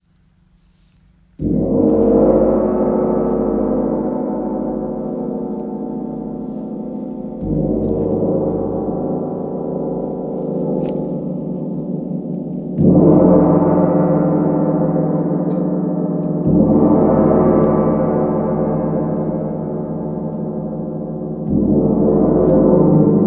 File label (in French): Gong Nepal 66cm son